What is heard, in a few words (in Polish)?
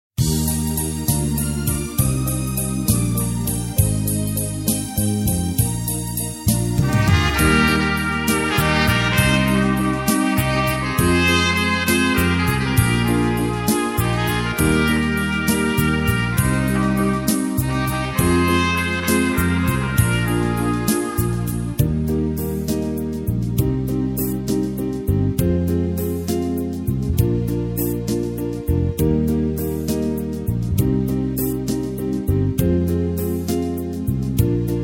bywaja dni wesole instr